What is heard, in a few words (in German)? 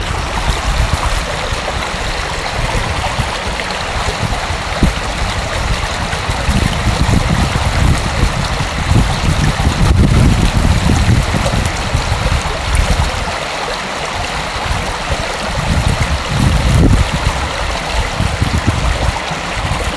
waldbach